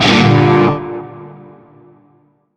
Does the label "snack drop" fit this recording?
No